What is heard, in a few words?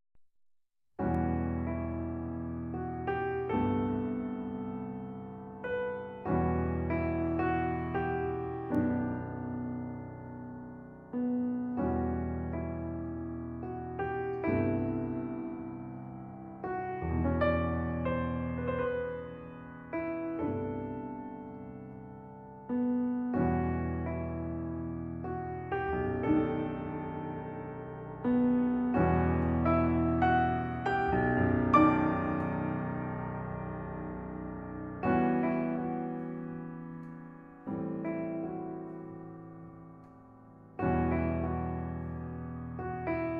Nocturne D Major